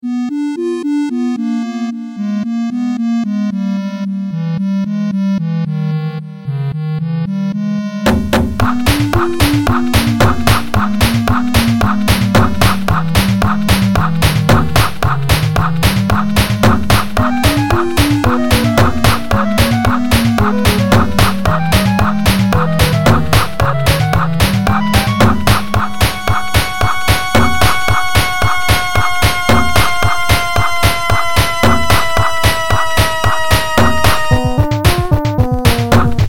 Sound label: gallop